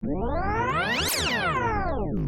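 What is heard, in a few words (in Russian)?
звук перемотки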